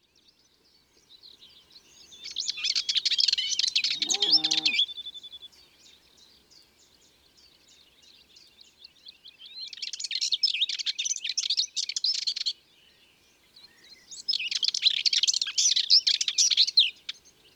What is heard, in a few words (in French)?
Fauvette grisette; ABC des chants d'oiseaux